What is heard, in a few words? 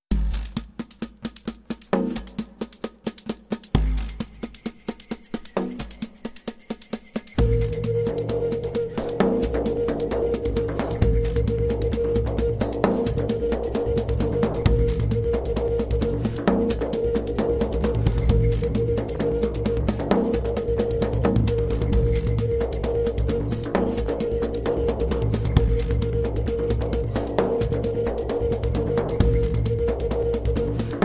Pushing Forward